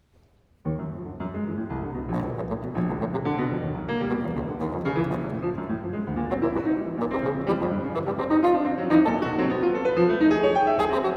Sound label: Trio